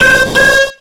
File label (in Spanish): Grito de Xatu